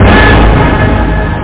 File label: ORCH HIT